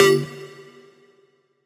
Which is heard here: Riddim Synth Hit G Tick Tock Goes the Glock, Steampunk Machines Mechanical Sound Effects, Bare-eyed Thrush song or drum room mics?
Riddim Synth Hit G Tick Tock Goes the Glock